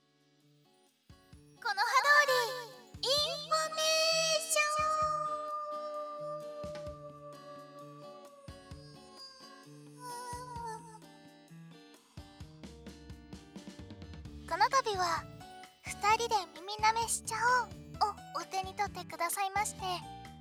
二人で耳舐めしちゃおう, ASMR; このは通りインフォメーション

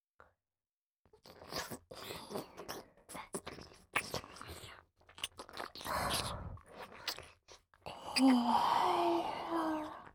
ひそひそと囁かれ、耳舐め, ASMR; わくわくぺろぺろ

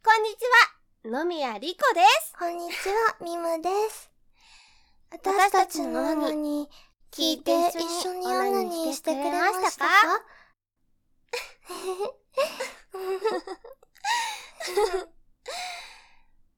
耳舐め カウントダウン

